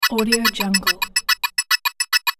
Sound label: working device calculating process